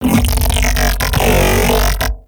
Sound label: Mech Ambient Vocal